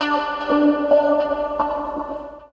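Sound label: guitar, right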